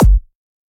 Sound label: Dr Kick